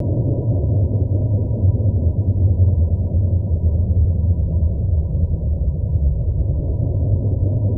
MASCHI; Best Service; Advanced Mega Effects

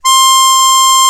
MUNDHARMO; Alpine Volksmusik

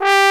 POSAUNE; Alpine Volksmusik

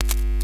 KNISTER, NOISE; Xsample VOL-9

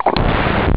wasser; ST-XX Amiga Soundtracker Sample Packs

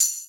TAMBURIN; PERKUSSION; Alpine Volksmusik

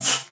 PUSTE, bassoon; Xsample VOL-6

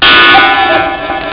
kuckuck; Amiga Soundtracker sample

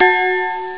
uhrschlag; ST-XX Amiga Soundtracker Sample Packs

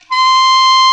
KLARINET D 4, CLARINET

